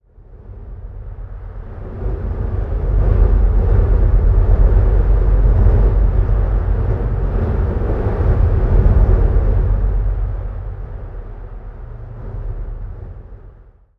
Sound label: environment temple winds stinger outdoors; Aragami